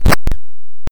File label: arrow drop; ludumdare; ld31